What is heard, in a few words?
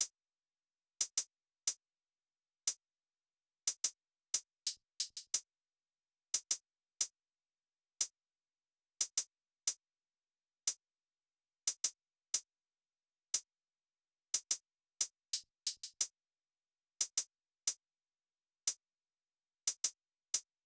Drum Loop - 90 BPM Hihat, RnB; Distraught; Cymatics; ANALOG EVOLUTION Beta